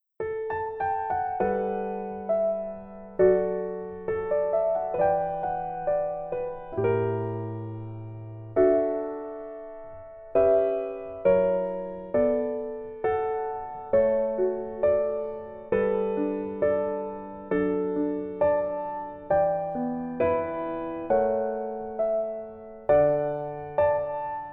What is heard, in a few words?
Piano ver